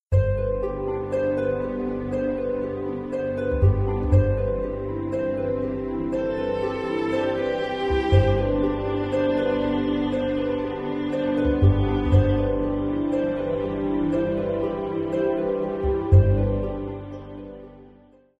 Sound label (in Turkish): santral fon muzikleri